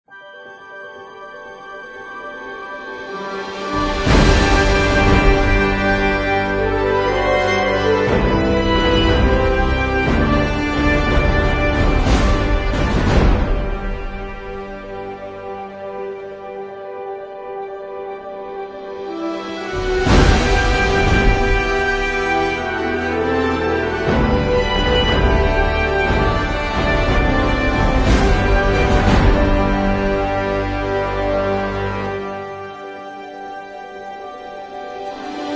Hollywood Opening Short or Commercial

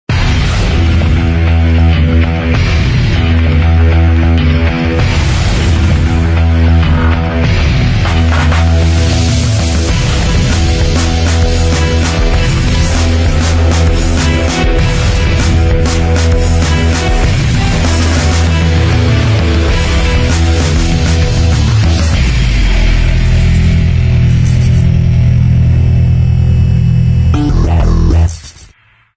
Break; Ruslan Ivanov

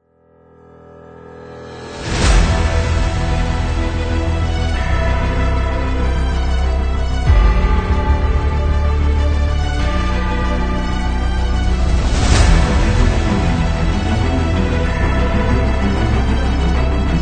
Tension

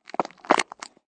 Chew Food Mouth Closed

